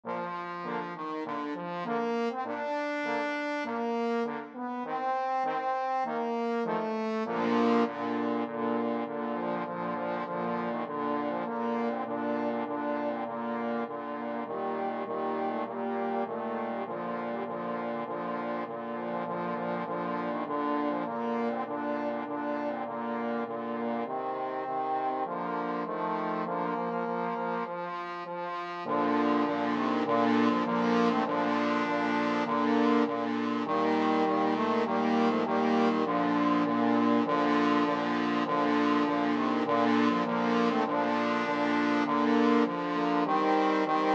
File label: trombone quartet